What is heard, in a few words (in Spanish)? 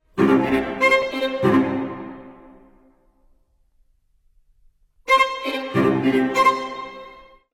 cuarteto de cuerda; Witold Lutosławski